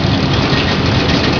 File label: bucket conveyor; objects; Heretic II Demo